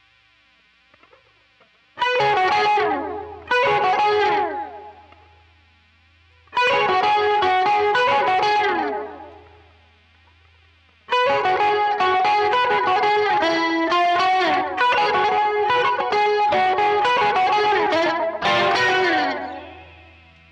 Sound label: quilter solo